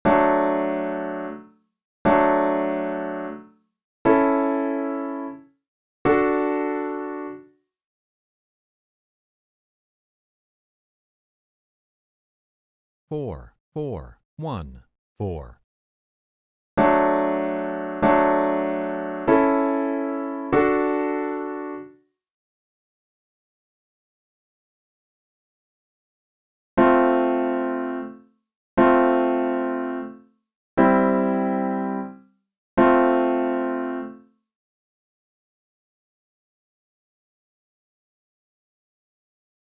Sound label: I, IV, progressions